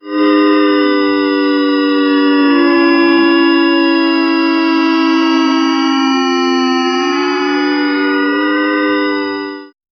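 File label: slow boss siren for mobile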